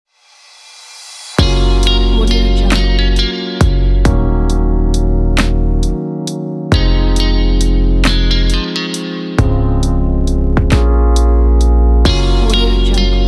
modern and warm chill pop

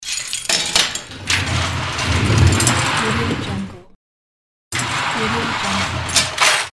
metal gate

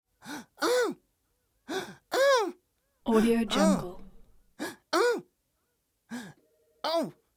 easter bunny scared gasps then moan surprised

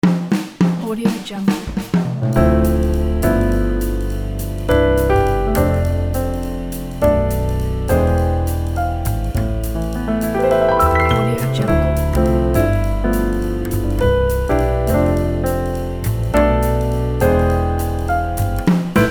jazz piano

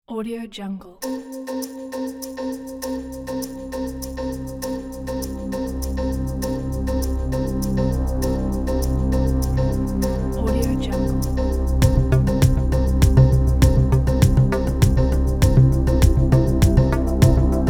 ambient electro advertising

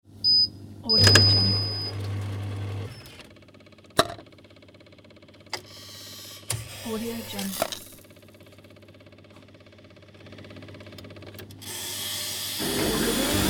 automatic cappuccino machine

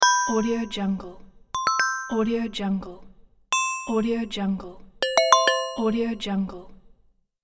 achieved

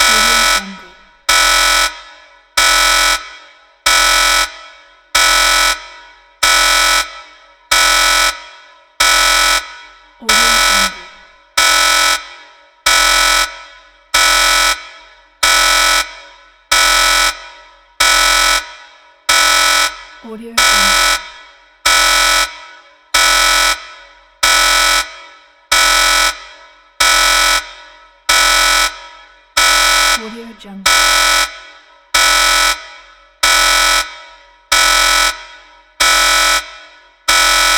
the alarm prison

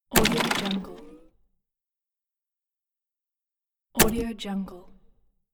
user interface mechanical selection; inspectorj

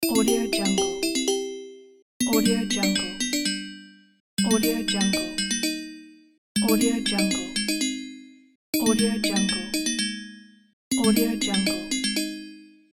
small success

